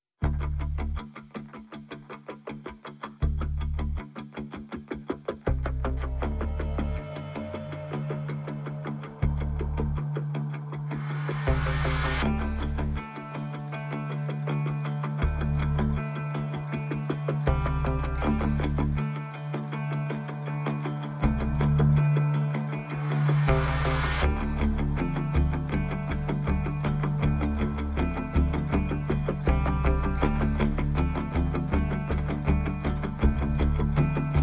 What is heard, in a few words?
No Perc